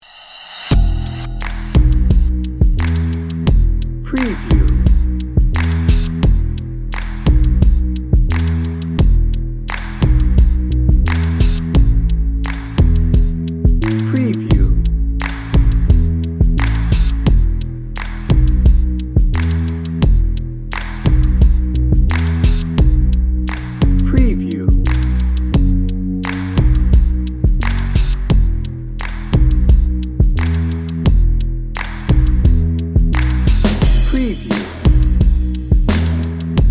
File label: rain on the roof dnb